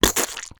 Splat

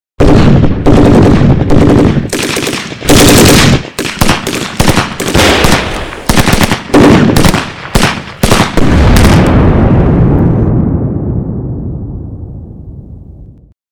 Basic Gunbattle

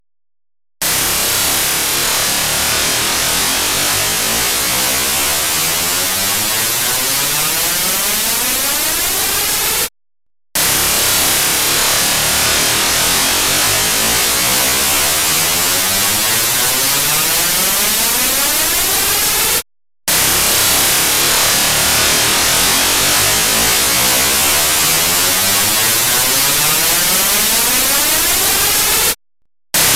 Scary Rev Up Noise

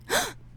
Female Gasp

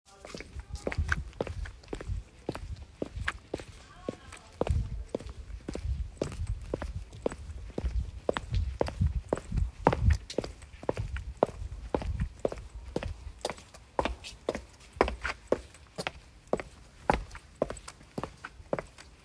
Female Heels On Night

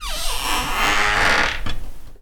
Squaking Door

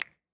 Snap

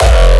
Hardstyle Basic Kick

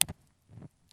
Flame clic

